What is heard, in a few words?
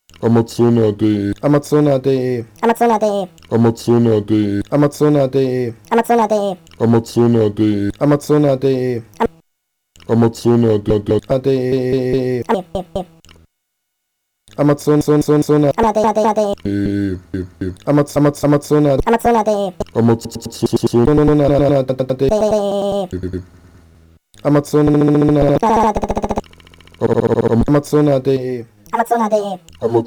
Pocket Operator PO-133; Teenage Engineering